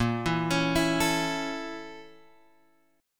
A sharp Minor Major 7th Flat 5th A sharp Guitar Open G